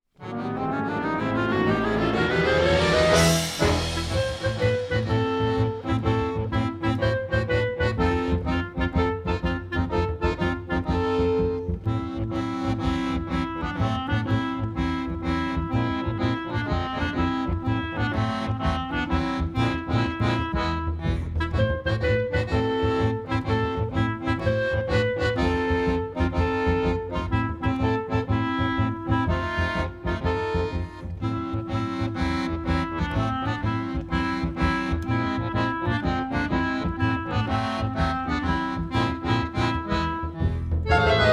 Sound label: playbacks; barouf; barouf au musee